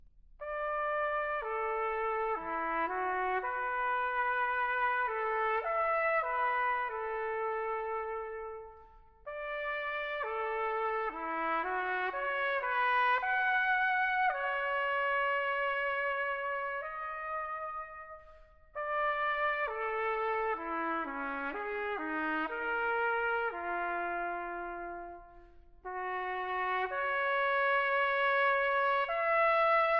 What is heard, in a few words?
Four Concert Duets, Trumpet